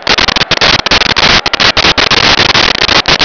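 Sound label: ambience crowd distant loop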